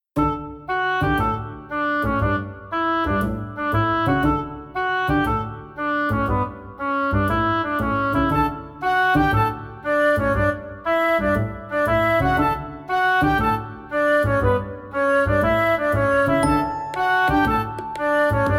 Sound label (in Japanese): toy box, 明るくハッピーな雰囲気のかわいらしい日常系BGMです; ほのぼのした雰囲気のコミカルな日常系BGMです